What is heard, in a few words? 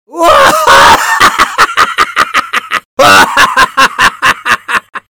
laugh; demoman; fortnite emotes